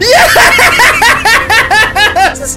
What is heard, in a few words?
yeah laughing